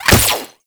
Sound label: Gunbrella Bullet Deflect; Koumei